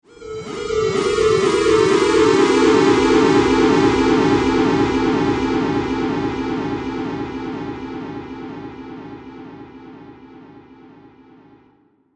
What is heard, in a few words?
Twisted Theremin